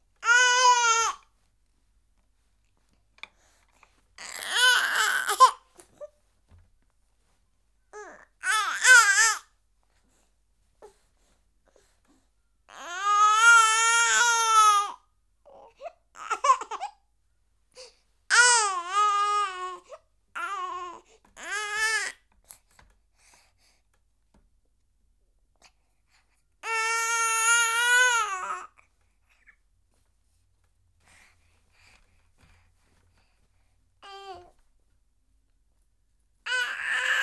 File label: Month Old Girl, Temper Tantrum, Babies; BBC Sound Effects Library